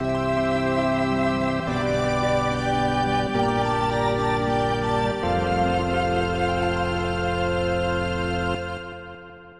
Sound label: Jingle